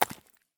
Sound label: run glass; Escape from Tarkov